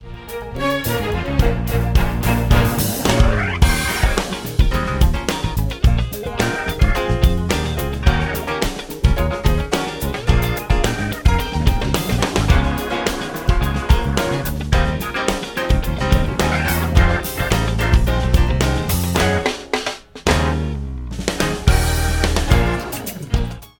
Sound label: Accompaniment